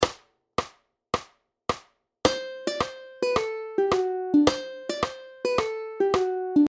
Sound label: Dotted 8ths